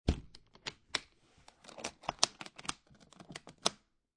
Guitar Case Close Sequence, Foley, Guitar Case Being Closed, Four Buckles Closing In Sequence; Latch